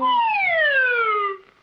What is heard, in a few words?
whistle down